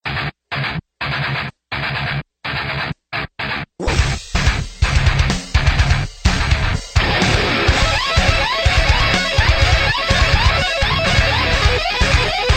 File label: epic metal solo